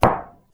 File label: foot